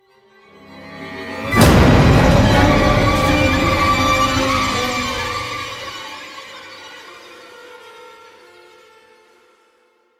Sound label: Surprise